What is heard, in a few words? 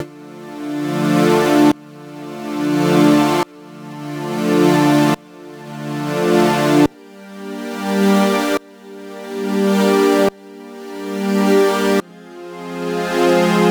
Bpm140 Wonky Pad Loop Dm, Dubstep